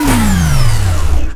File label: Scifi Forcefield Deactivate; Energy & Forcefields